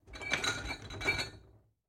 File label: Glass Clinks Glass Bottles